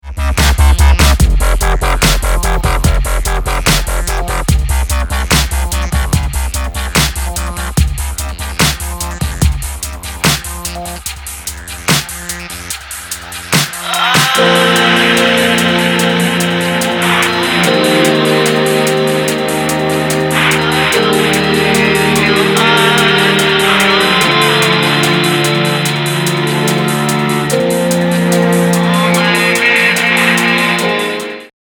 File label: dub